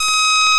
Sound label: cast; u1-dos-sounds